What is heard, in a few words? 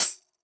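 closed hi-hat; eddyflux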